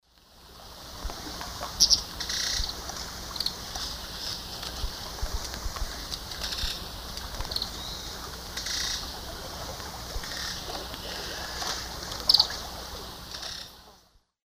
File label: Atticora fasciata, calls; S. America; Eastern Lowlands